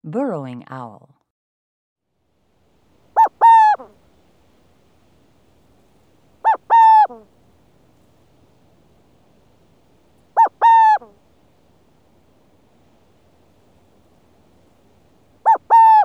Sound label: Burrowing Owl